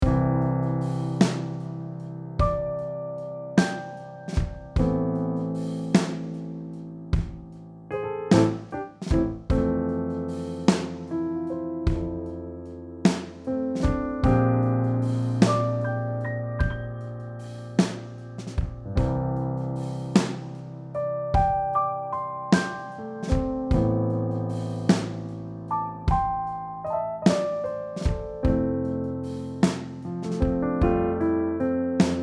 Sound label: 6 8 Mixed